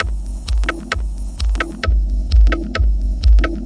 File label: 131 thinpass techno, drum loops and breaks